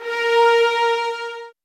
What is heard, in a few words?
A, soft violins